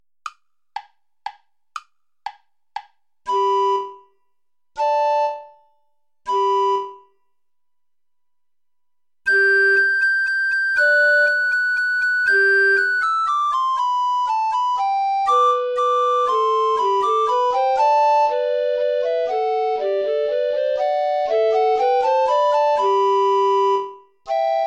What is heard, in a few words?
play along without soprano recorder; alter ungarischer tanz